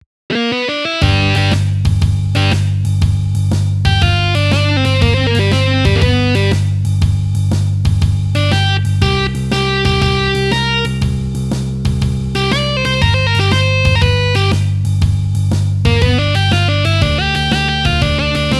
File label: Variations Blues